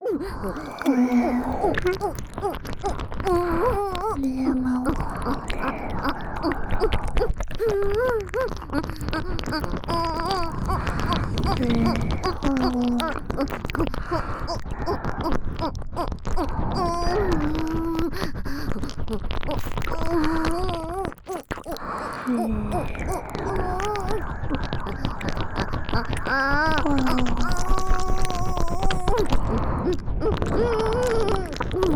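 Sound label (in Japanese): オホ喘ぎ SE無＋ぐぽぐぽW耳舐め; 3WAYループ; おまけループ（オナサポ）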